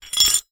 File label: Hole Saw Rattle, Hole Saw Bits